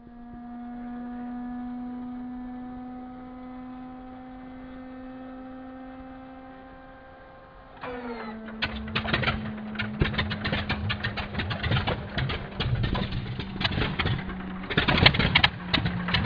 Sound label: starter, SPAD VII; Bombable; flightgear